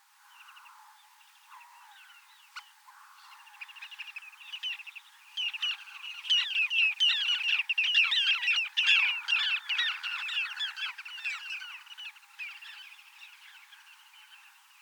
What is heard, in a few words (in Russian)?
kamnesharka golos